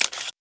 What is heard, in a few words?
aps clip out; paranoia